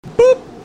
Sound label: boop